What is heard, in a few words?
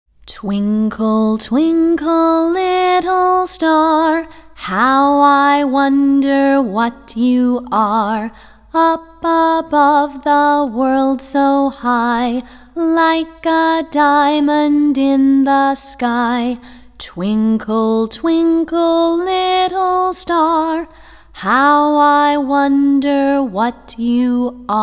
song